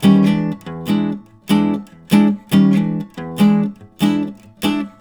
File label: RIFFS, Blues Guitar; ILIO; Fretworks